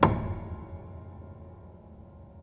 Hamm Perc Ped, Piano Hammer Perc Ped; Piano Miscellaneous

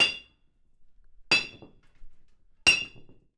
Hitting, Workshops

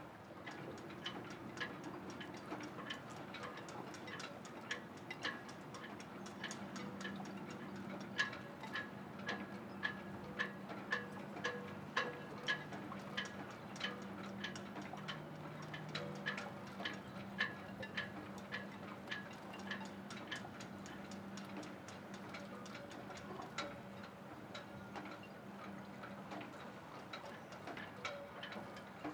Sailing loopable

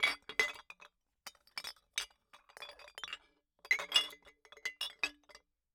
Bottle Struck

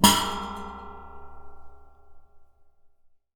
Classical Guitar Buzz Pluck; Classical Guitar Extended Technique Stereo

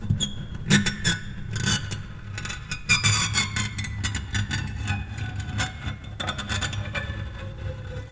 String Scrape, Piano; Piano Miscellaneous

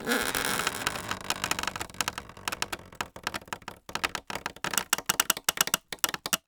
Polystyrene Creak

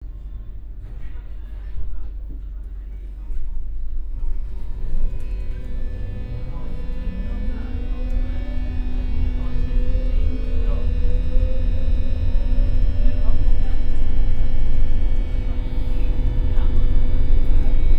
U Bahn Berlin; Sound Scapes

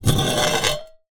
Drag, Vase

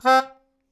Melodica Short; Short Notes Chromatic

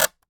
Polystyrene Squeak

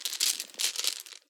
Marbles Rattle

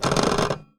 Typewriter

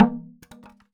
Polystyrene Rattle

